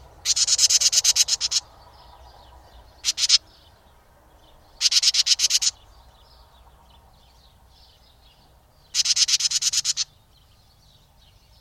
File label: birdcalls